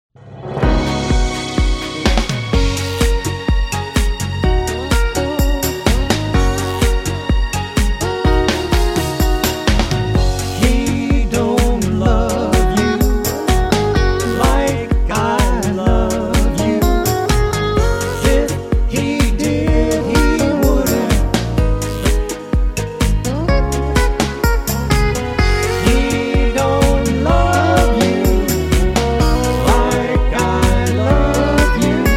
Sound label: harmony sample